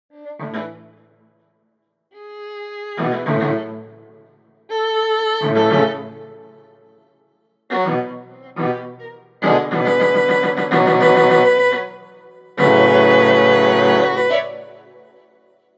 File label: Violin String